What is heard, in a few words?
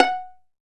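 pizz viol, pizzicato violin; E-MU Producer Series Vol. 2; More Studio Essentials; Composer; St. Arco Solo Violn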